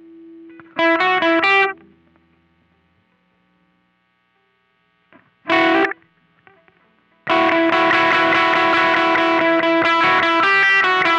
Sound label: Riff Slow